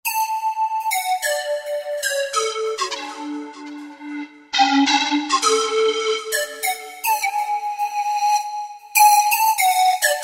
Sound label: Lyric Pipe Solo, Roland JD-990; Factory Patches